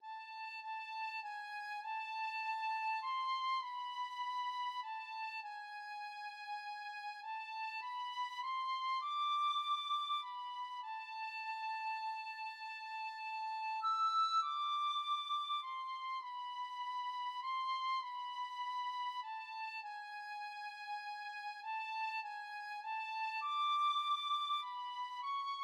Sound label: coventry carol, tin whistle